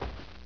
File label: step, hgrunt; Source Engine; bshift